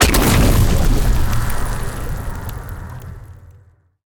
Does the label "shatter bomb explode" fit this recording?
Yes